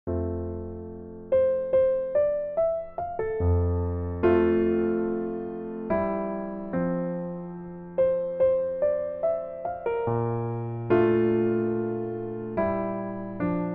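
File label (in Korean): Piano version 멜로디MR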